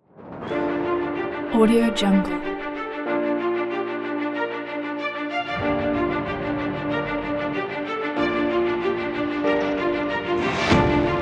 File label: dramatic sport kit